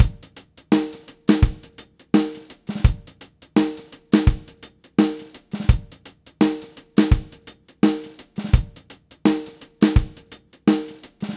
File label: Reggae, Drumset; Sonic Foundry (Sony Creative Software); Joe Vitale Latin Percussion